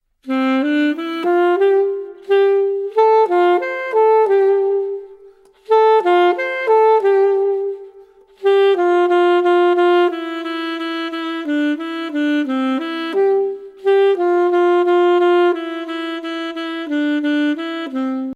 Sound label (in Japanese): アルトサックス